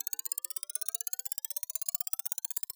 Reel Spin Loop; netent

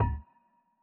reel Bounce; netent

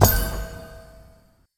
scatter Hit; netent

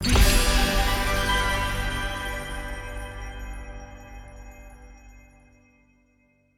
large Win; netent

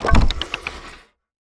Quick Stop Bounce

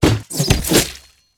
scatter Land second; netent

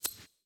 sym whoosh to reel

